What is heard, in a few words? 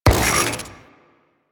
Vial Radiation Shatter; Warframe; Alchemist